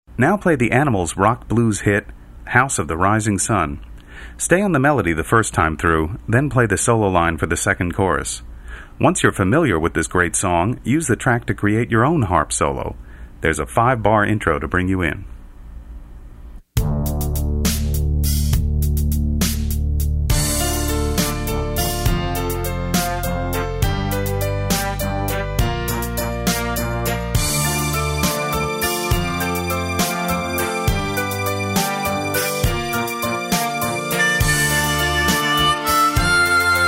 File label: house, harmonica